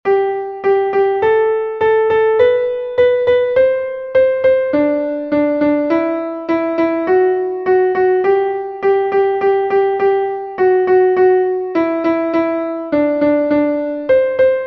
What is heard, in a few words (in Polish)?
Gama G dur